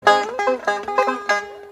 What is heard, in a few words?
Banjo Notification